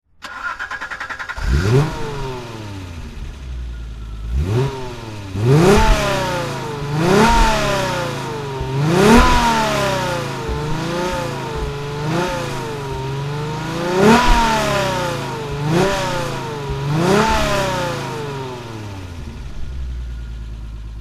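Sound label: alfa romeo sz throttle, engine sound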